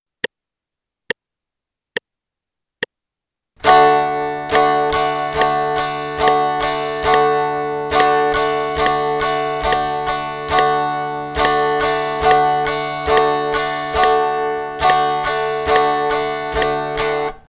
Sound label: D strum in eighth notes pattern 1, guitar